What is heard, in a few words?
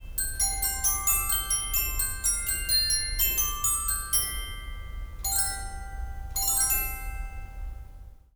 glockenspiel